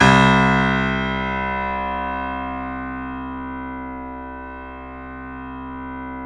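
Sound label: piano, C, right; InVision Interactive - The Piano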